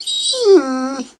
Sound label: Wolf Die; The Battle for Wesnoth